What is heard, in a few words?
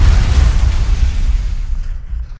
tumbling, factory